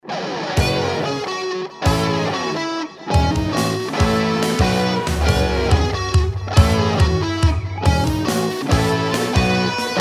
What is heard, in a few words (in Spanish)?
musica y risa theme